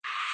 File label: short slide